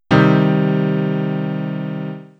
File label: C minor triad, piano